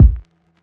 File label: kick; eddyflux; crate bd; dough-samples